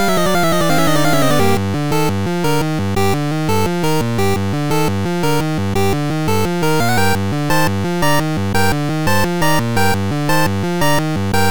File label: 8bit